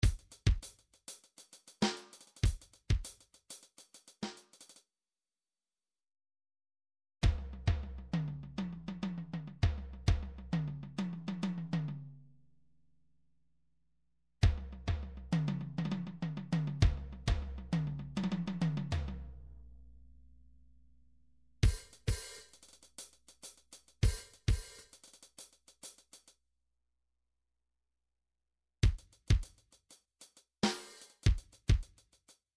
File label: midi drums